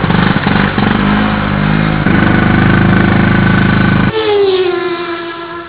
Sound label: racing